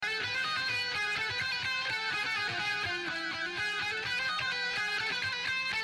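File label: Egyptian Guitar Tune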